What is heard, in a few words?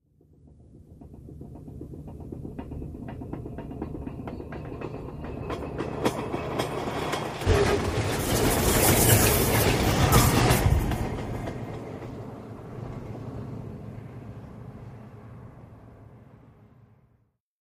Train By Very Close, diesel